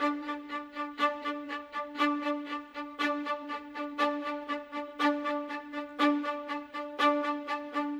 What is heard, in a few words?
Viola, Orchestral; Sonic Foundry (Sony Creative Software); Orchestral VOL-2; Modern 24